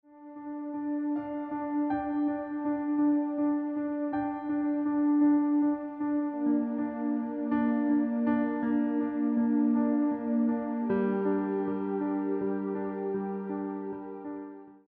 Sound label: accompaniment